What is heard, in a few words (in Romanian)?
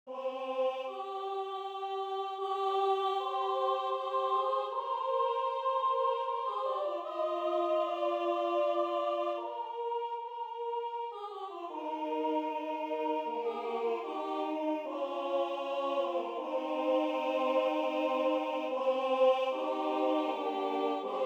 Sculati sculati boieri mari, Bucovina, colinde